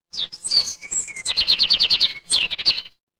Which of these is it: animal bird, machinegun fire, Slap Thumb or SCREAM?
animal bird